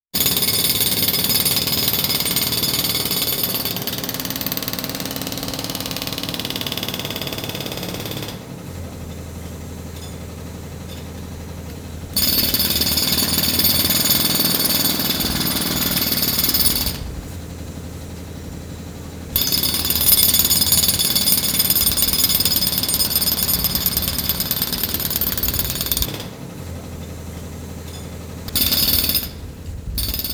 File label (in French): tramway marteau piqueur